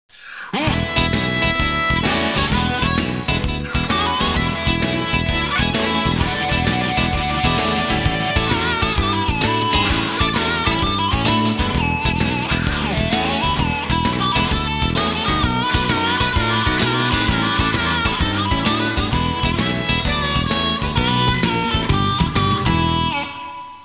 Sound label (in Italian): suonerie blues